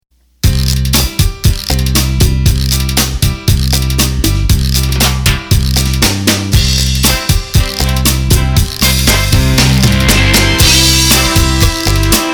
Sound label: Cha-Cha; roland bk7m